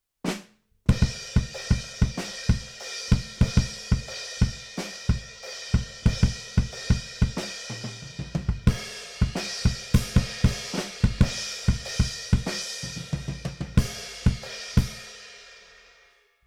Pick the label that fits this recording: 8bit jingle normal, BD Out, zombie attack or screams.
BD Out